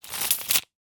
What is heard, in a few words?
Metal Tape Measurer Coil Up Fast; The Sound Pack Tree; Construction & Tools